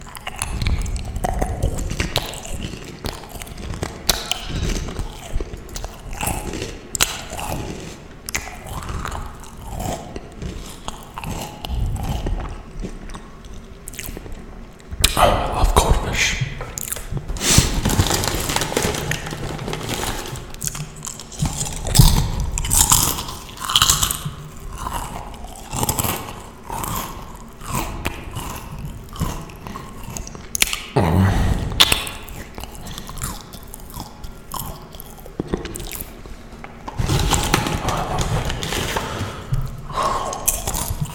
goldfish reverb

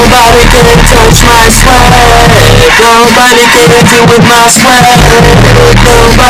yay

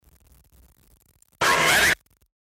dj drama dramatic

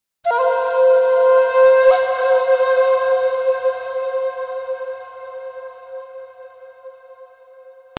Enigma Flute

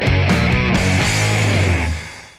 lick, guitar

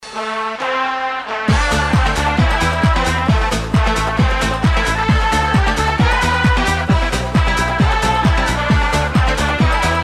samba

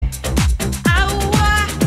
owah

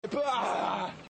bwhrahhh

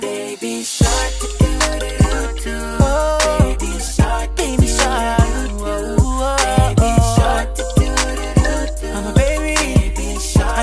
R&B version

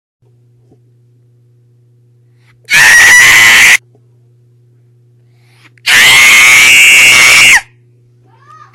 loud scream

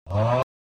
auughh

